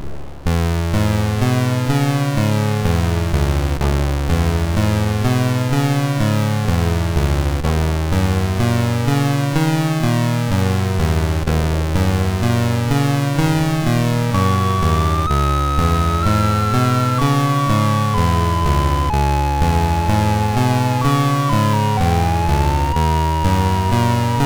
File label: Game Over, sid MOS6581R2